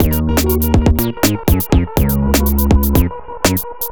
ringtone, hiphop rnb; Google; NewWave Labs

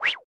sent message success; BLU G60

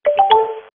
Receive MSG; LG CU405; MSN; IMTone

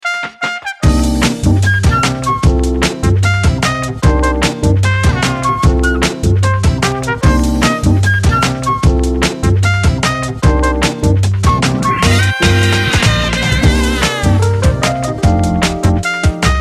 Funky Soul; LG JN240 Remarq

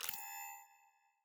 Undock

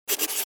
Key Tone

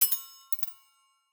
Glitz, notifications; Google; Google Sounds; Gems

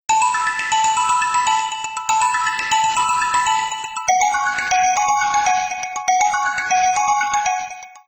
Ring Update; Samsung SGH-i617 BlackJack II

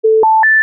alarm

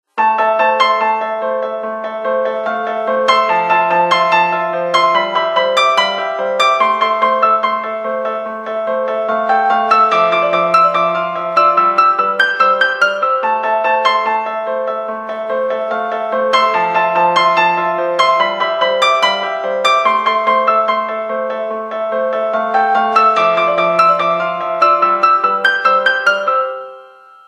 Ring Melancholy; HTC T-Mobile Shadow

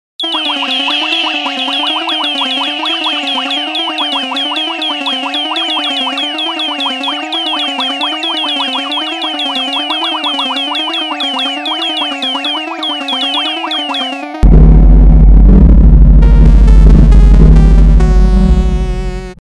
80s Arcade ringtone; Nokia

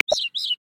Vampire Finch, Galapagos; Xiaomi MIUI-14

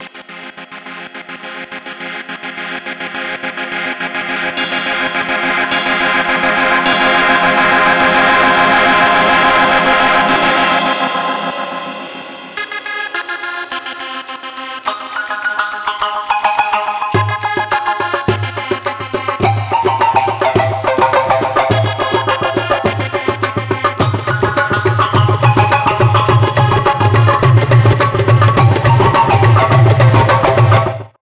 trance alarm; Sony Ericsson; PlusFourSix; Thomas Tibert; TranceBabaAlarm

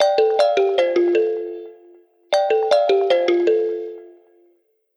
ringtones; Motorola Moto G20; lenovo

